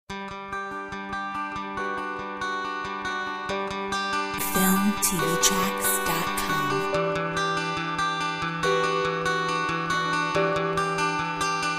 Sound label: Harmonic Folk full